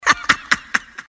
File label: haha; cstrike; sank sounds